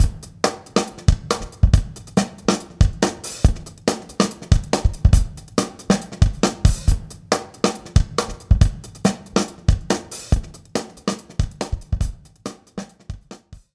Beat Only; rayman arena; Pirate Stronghold

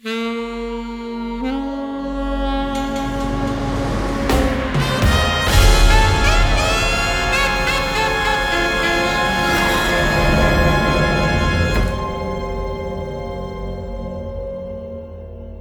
Round Start; Round 5 Start (Short)

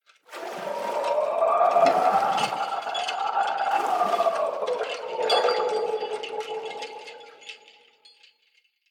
Well; The Town; Easter Egg

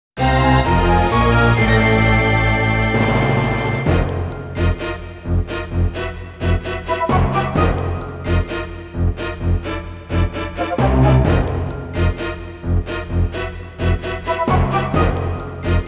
Shock; Wild Arms

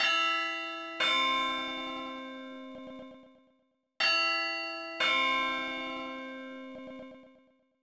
Chime; pokemon ranger shadows of almia nintendo ds

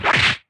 Punch

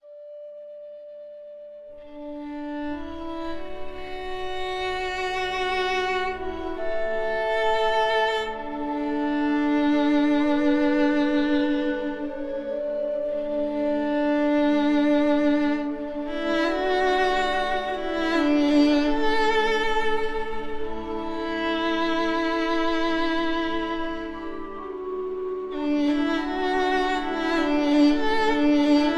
Ambient Idea; The Lost Legends of Redwall; The Scout Act II

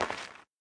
dirt footstep

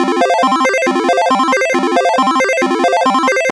Invincibility

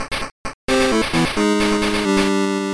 Game Defeat; tokusou sentai dekaranger; pico

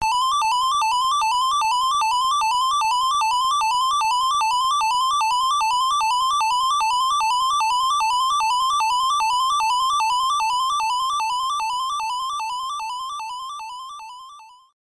Bird Attack; nes; Back to the Future II & III

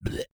Monster Spit; A Robot Named Fight

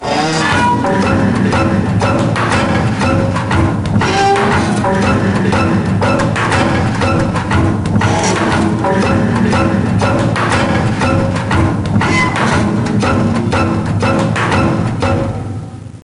Loop

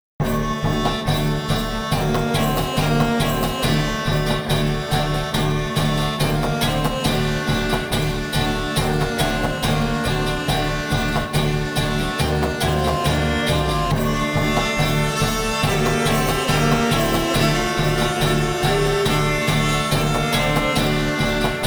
Combat; Kaermorhen

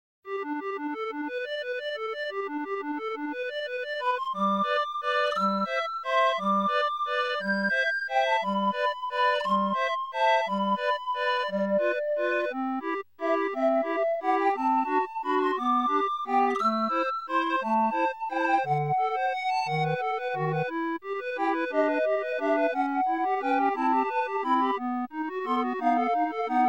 Folk Dance

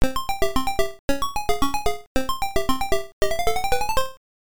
Start Music; pac man msx

